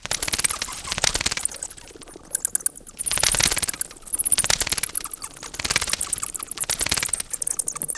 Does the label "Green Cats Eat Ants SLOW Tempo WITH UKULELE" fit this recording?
No